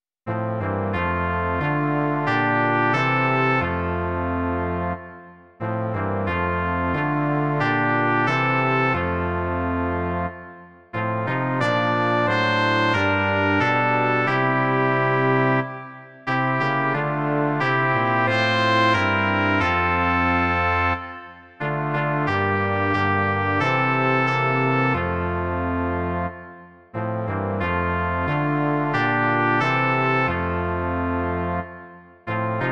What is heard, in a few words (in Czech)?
žesťový kvartet; Tys, ó Pane, síla má; Imaginativní verze